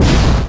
jetpack ignition, power armor; powerarmor t51

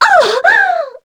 succubus hurt strong; queen girl

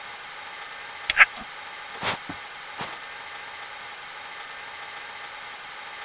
idle, weapons; cso

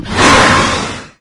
attack solo, maggot; doom3

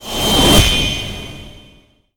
sword transform

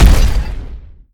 step run; gundyr

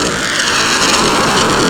heavy plasma loop

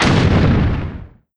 explode, revanent; doom3

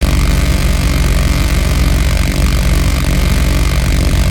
machine gun loop; gow; silverback

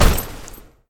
step

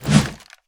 axe swing miss; l4d2; talos axe; weapons

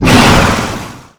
dragon shout short

